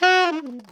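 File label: alto short fall F#3, saxophones; Greytsounds - Philip Wolfe David Crozier's Saxophones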